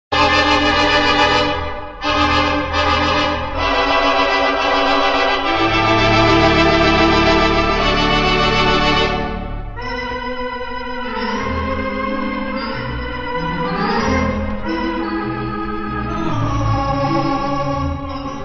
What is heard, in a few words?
theatre organ